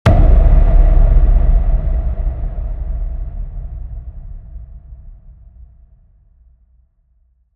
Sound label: HIT SciFi Low, Cinematic Hits Designed